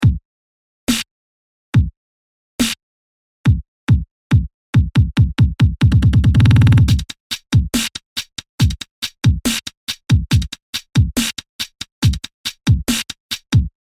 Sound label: Bass Amp